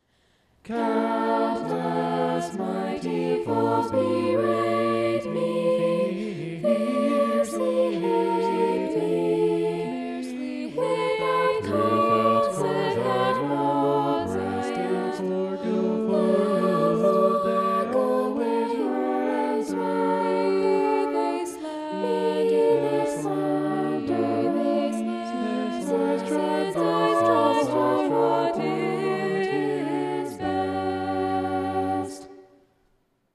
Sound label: vocal poly; psalm 38